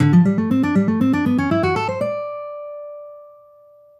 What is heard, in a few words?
Outside Pentatonik Melodic Rhythm